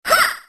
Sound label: attack; snes; smas; venus